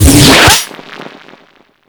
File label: Fire Stop, Weapons; Tentacluster Fire Stop 3D; Warframe; Infested Tentacluster